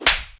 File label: HARD SLAP; tenpin